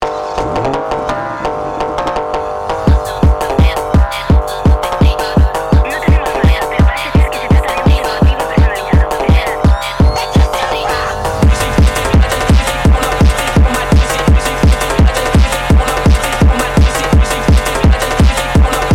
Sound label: Speed Up